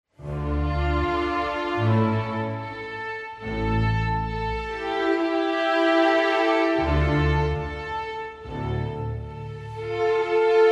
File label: Violin Concerto in D Major; Card Shark; Chevalier de Saint-George; Op. 3, No. 1, Mov. II Adagio